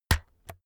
keyboard external key hit slow

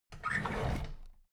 drawer big squeak open heavy

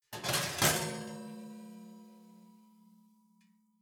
oven baking sheet draw out

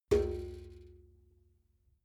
metal bucket hit soft

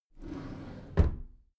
packed drawer smooth close soft halfway

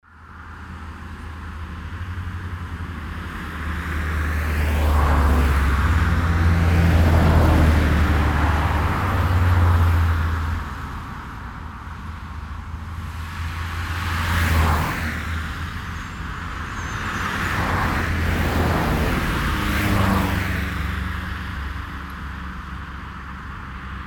traffic on main road

